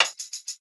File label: dance; Immortal